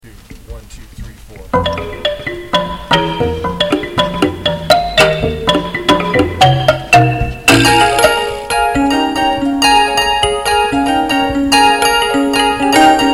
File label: Piano